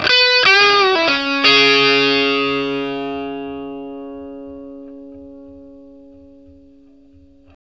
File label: Vintage Blues Guitar, 60, CS; USB Soundscan vol.22; AKAI